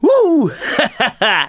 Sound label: Emote Cheer; Twitch Skijump